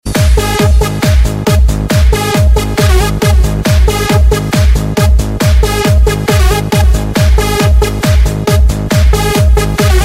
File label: bassmix ringtone